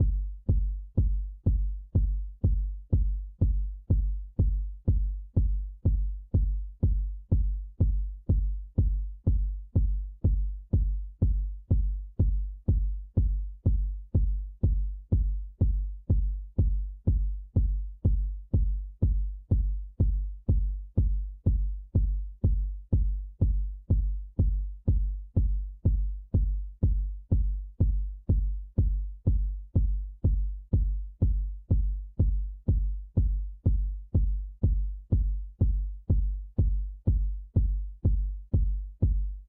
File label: KICK